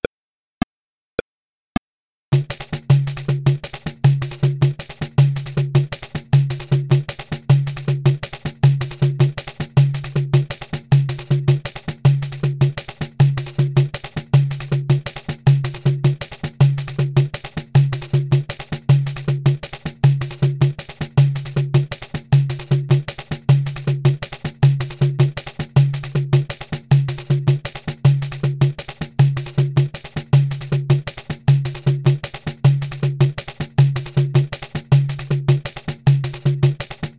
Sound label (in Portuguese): Pandeiro 105bpm menor